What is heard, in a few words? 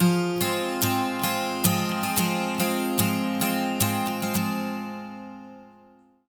110 MIN 12 STR, Acoustic Guitar Loops; USB Soundscan vol.04 - Electric & Acoustic Guitar Loops; AKAI